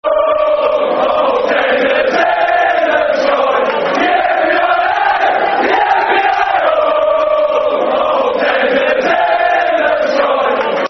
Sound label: Football Chant; Yippi Aye Eh, Yippi Aye Oh; Aston Villa Avfc